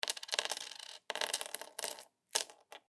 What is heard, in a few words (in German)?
Muenzen fallen